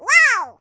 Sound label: whoa; sm64coopdx; char-select-extra-chars; yoshi